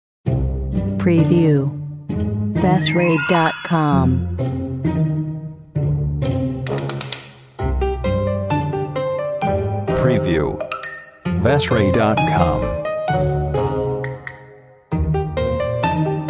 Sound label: Spooky Kids Game